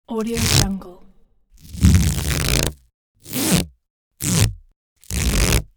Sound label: duct tape peel heavy pack